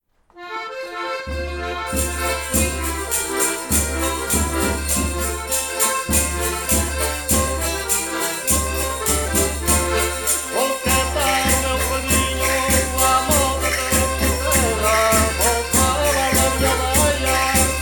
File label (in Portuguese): fado portugues